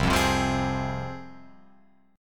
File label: C Suspended 4th Sharp 5th C Guitar Open F, Csus4#5 chord; Csus4#5 strummed; 0,0,0,0,3,3; JGuitar